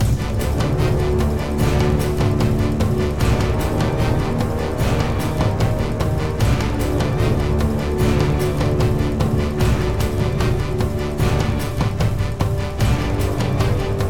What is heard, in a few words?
Creature Fight Main; Black & White 2